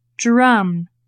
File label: drum